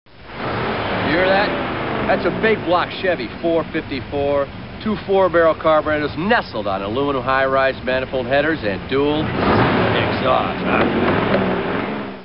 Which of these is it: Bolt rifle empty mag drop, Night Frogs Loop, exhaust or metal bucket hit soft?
exhaust